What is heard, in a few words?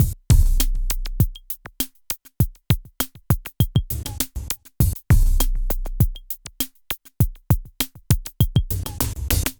drloop, drumloops, Vinyl Attack; Masterbits